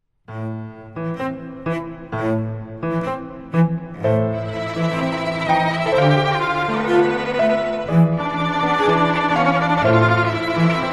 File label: piano